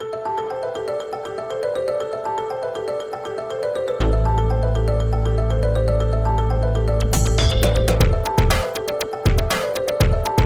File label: battle; pypvz